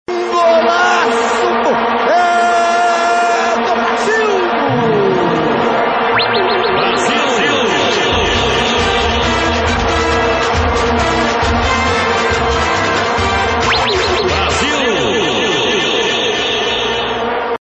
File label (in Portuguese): Golazo De Brasil, vinheta grito de gol do brasil